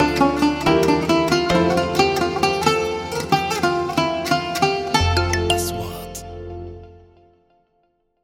نغمة شعار; sound track

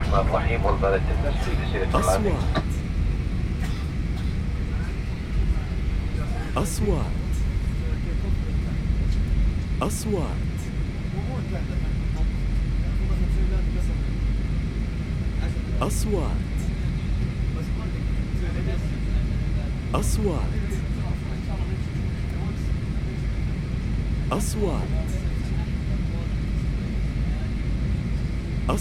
هبوط الطائرة, airplane landing

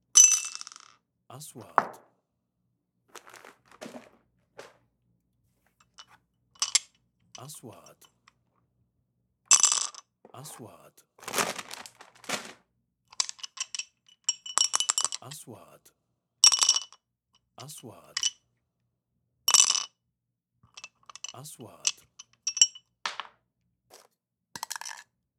صوت كسر الثلج مشروبات باردة

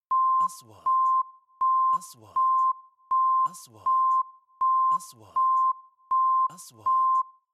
نغمة تنبيه خطر; transitions

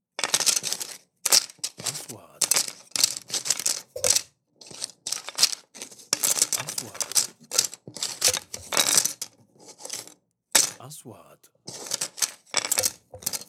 درج ادوات مطبخ خشبية

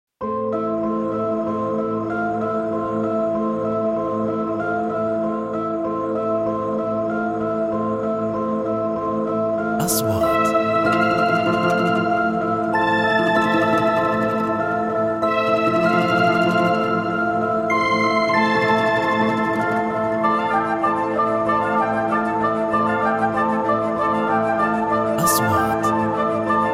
موسيقى تصويرية خيال; fantasy

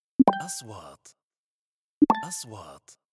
نغمة نقره الكترونية, transitions

